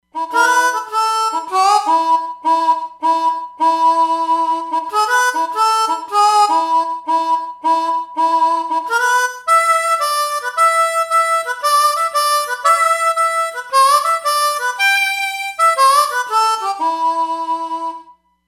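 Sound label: Blues Harp, Roland Fantom XR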